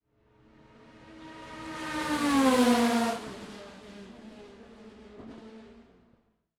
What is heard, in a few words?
Race Car, Indy Lights, Before Turn, By, Downshift, Crazy; Airborne Sound; Race Cars